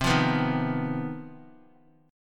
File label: C Minor Major 7th Double Flat 5th chord, Guitar; strummed; JGuitar